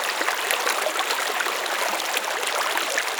river stereo

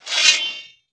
sword draw

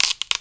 STEP

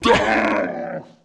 ORC ATTACK

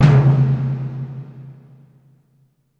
conga

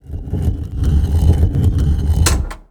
TURN STONE

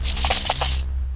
attack

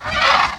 hurt; mahlendur

